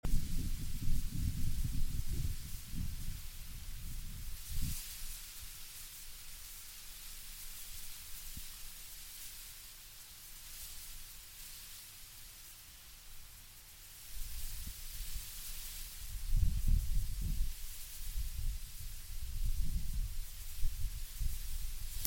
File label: soft wind